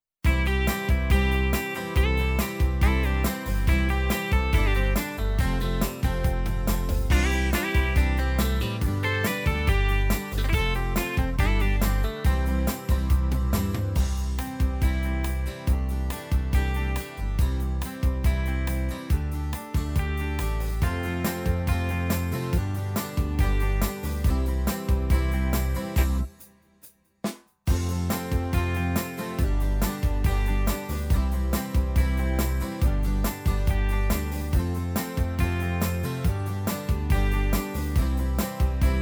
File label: Easy Country